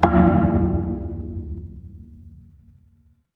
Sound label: instrument wood block